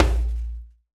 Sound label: Afro Drum Open; Roland BK-5; Percussion